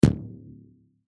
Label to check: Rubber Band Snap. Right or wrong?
Right